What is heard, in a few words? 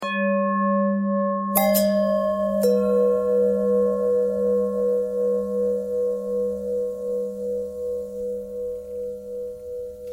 longbell bell bendthread